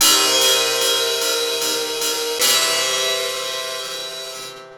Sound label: Strings